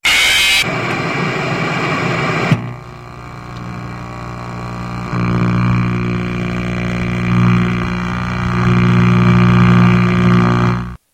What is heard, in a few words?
scream death; FNAF